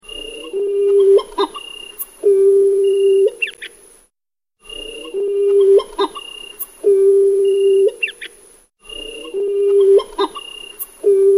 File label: Hawaiian Petrel